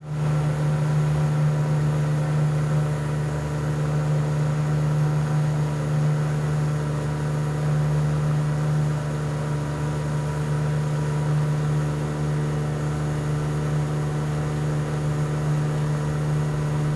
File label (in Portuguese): Motor de lancha em alta velocidade